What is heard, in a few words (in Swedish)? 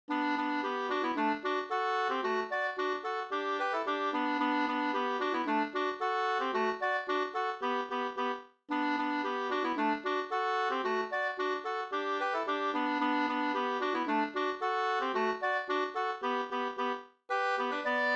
polka